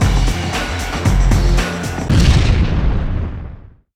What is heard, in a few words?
Video BGM